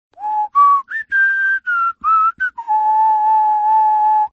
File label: sweet whistle